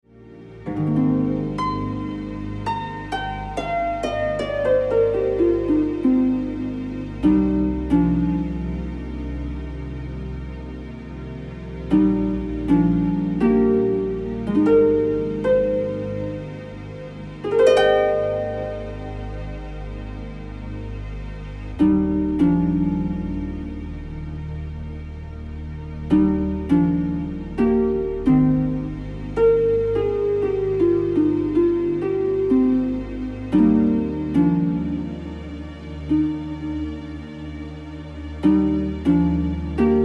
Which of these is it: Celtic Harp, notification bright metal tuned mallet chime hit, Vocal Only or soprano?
Celtic Harp